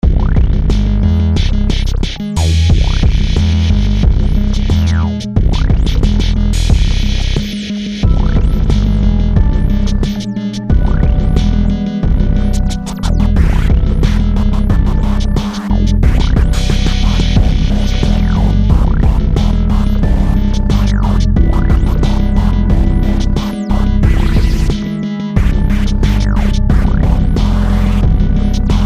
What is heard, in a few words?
synthetic scratch